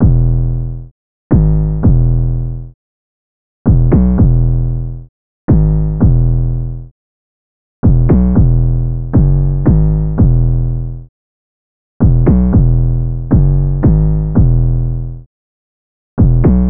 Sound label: 808 loop 115 E; Slate Digital BASSHOLE Sample Pack